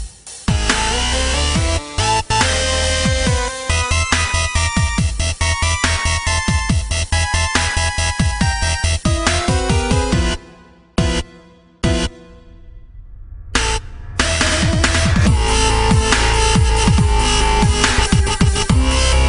DUBSTEP; LUIGI

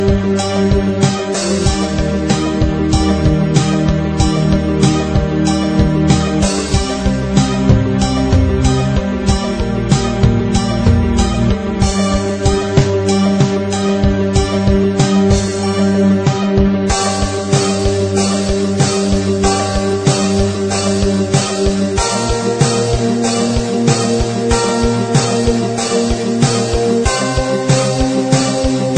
Bells

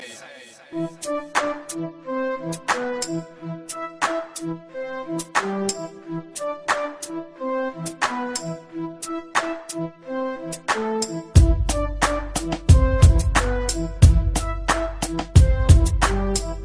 Hip Hop Ringtone

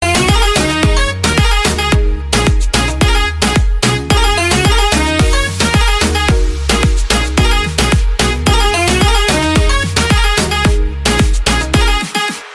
Bad boy bgm

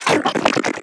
Zergling; meloboom

